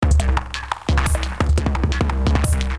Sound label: 174 Drums